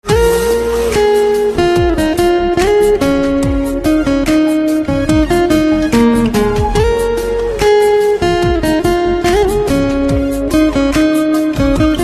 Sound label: Guitar Music Ringtone